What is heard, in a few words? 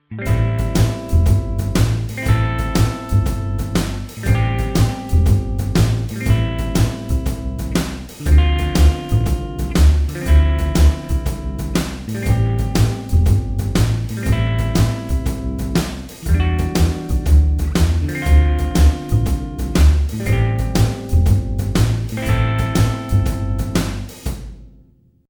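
chord progression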